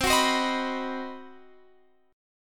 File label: C Minor Major 7th Flat 5th chord, Guitar Open F; CmM7b5; strummed